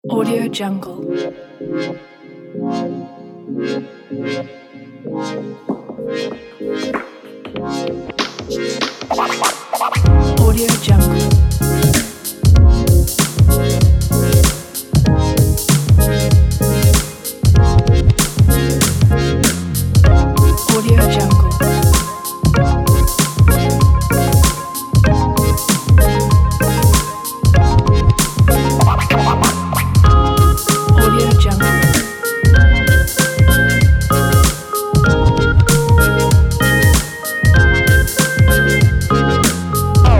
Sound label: hiphop summer kit